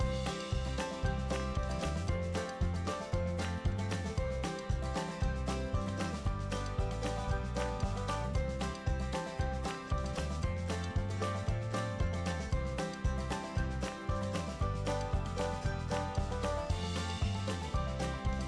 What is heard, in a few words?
music cheerful; bgm